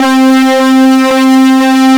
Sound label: Synths Note C; Best Service; House Definition VOL-1